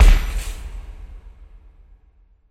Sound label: Explode